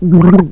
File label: gurp